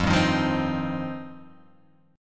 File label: F 7th Suspended 2nd F down